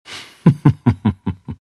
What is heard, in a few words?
pangolin laugh; dota